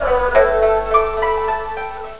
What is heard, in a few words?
draw; flutter chinese chees